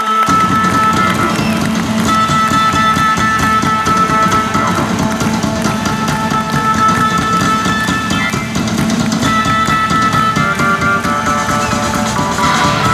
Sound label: Drum Solo; Providence 1975; December 13, 1975